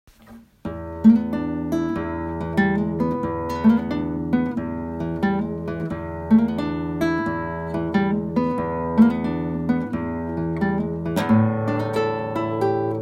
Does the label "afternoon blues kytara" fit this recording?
Yes